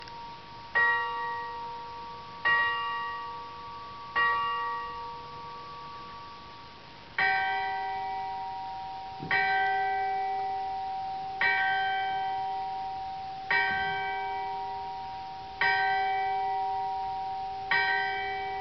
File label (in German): turmuhr